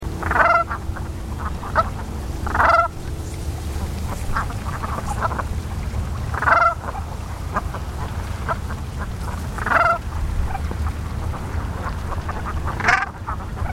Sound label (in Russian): chernaya kazarka golos